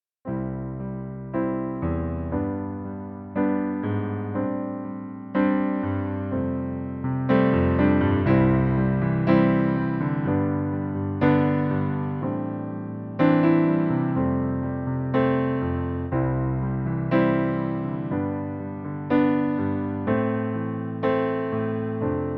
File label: White Notes Backing Track